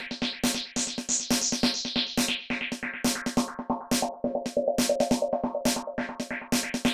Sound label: 138 Techno Snare Fx; Dance DJ; Drums; Pro Tools Essential Loops; Digidesign